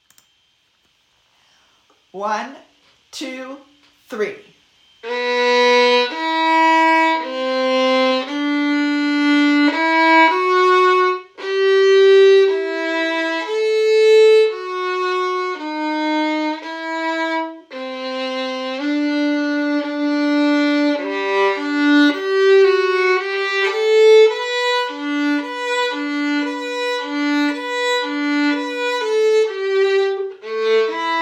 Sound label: My Country Violin; My Country Tis of Thee Violin 2